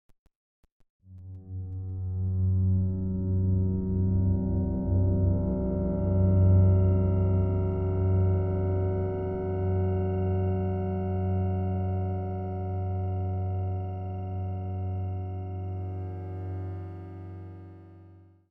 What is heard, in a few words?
Drone